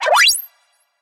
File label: risk level up; gambling; Burning Reels; wazdan